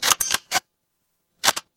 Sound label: office camera